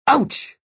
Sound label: Ouch Male, Male pain